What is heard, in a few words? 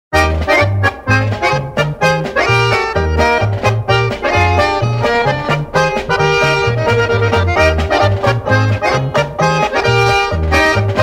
Clinker Polka, accordions